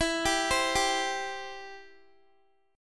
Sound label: E Minor Sharp 5th, Guitar, 4F,4A,5C,5G,5C,6E; arpeggiated; x,19,19,17,19,x